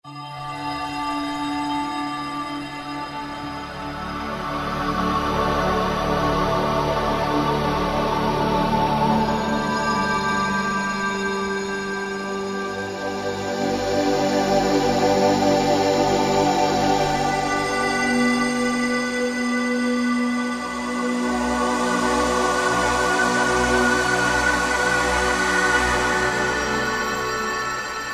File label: Voices, Roland PN-D50-04; STAR-TREK Voices